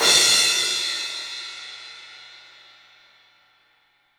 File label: Studio Cymbals; E-MU Producer Series Vol. 5 – 3-D Audio Collection; 3D Percussives